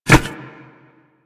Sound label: Wall Grab Metal Foley; Warframe; Tenno